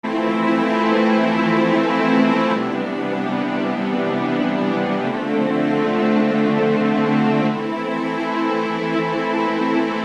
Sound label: String; Sonic Foundry (Sony Creative Software); Infinite Soul VOL-1